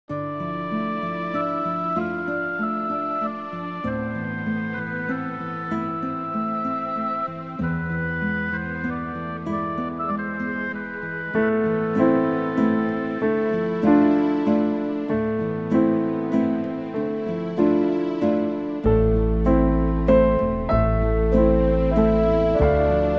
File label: Sad Lament FAST